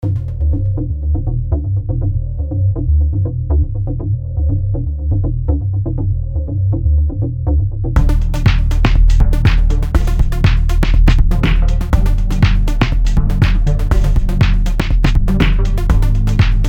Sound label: groove